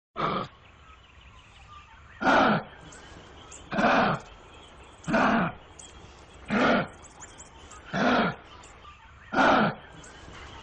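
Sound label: kangaroo sounds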